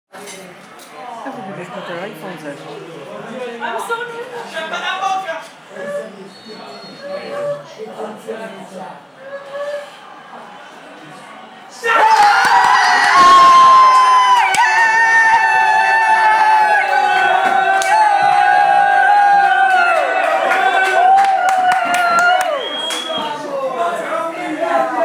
noise in roman bar as italy equalise versus germany; 2016 euros